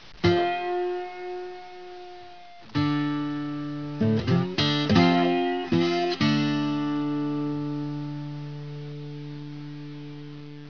intro music; ambience

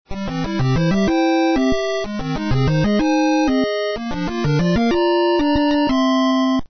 win mario

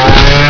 chain hit body

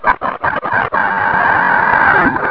attack

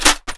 tommy reload clip out, weapons

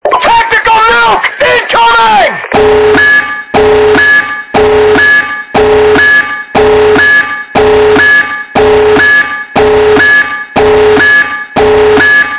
nuke

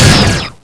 sniper unzoom

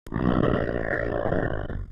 die; scmod; humanerror; agrunt

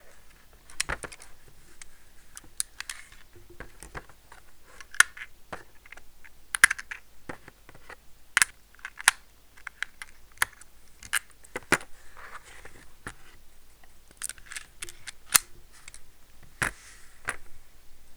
ammo inspection

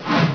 ham miss

